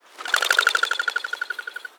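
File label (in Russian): пугливый голубь пролетел мимо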